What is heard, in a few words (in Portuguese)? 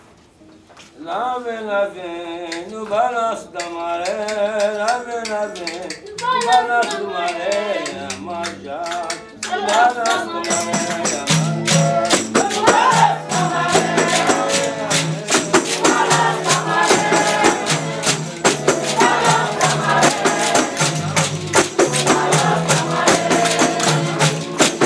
fanti baiao de princesas la vem; Baião de Princesas é um ritual especialíssimo e único que acontece apenas uma vez ao ano